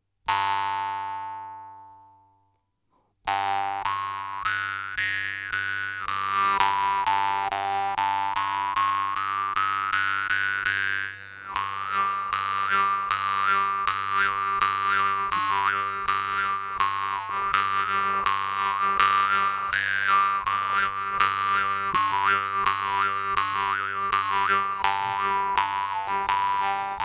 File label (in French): guimbardes; bartikanov g3